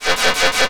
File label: Science Fiction Sci-Fi Electronic Energy Pulses Quickly